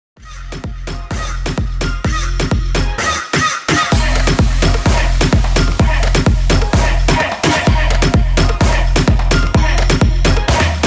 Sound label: BIG ROOM 128 BPM